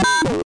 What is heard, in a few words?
c64 sid noize, Commodore 64 8bit SID Drums; LO FI and 8 BIT KITS